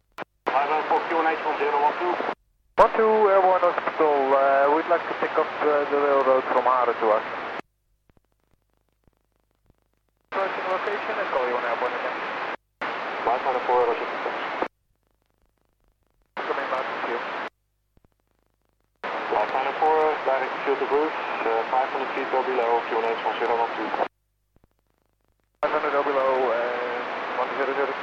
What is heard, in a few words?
lifeliner 4 scramble; to assen railroad